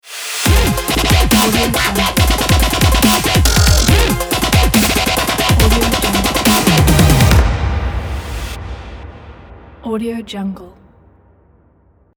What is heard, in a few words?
dubstep logo; prostockaudio